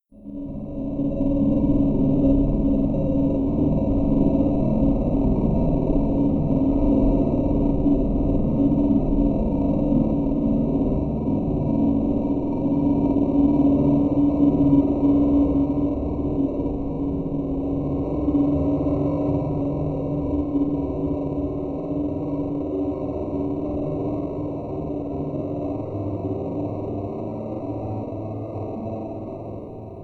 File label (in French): archet cavaque